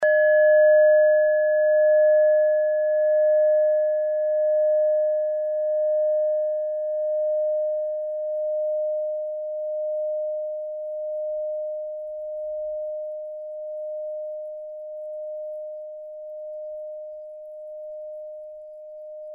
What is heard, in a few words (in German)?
klangschale nepal, Klangschalen Nepal